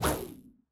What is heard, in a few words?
throw ball shock; CrossCode; battle